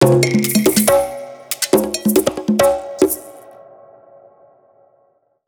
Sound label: tribal loop, Dubstep; Dubstep Sample Pack